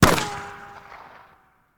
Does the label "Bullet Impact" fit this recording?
Yes